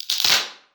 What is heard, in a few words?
audiorecording of a fracturing linden wood; obscura faehigkeit